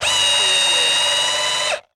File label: Electric Drill Tight; Sound Effects Bible